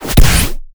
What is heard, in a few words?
sounds like snare